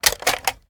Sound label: ar reload empty mag out; weapons; gmod; viper